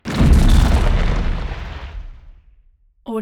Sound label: earthquake avalanche; game sounds